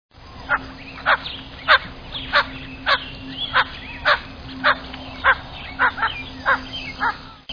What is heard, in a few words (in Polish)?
cyranka modroskrzydła; młode